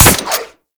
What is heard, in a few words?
Harpoon Gun Shot; Warframe; Sharkwing; Grineer